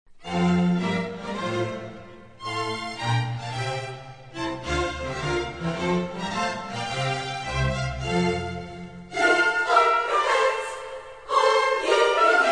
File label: Choir And Orchestra